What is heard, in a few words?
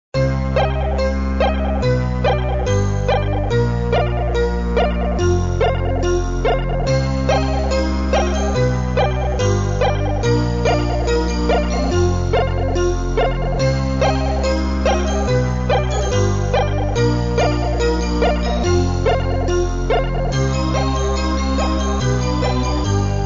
Arpeggio Sin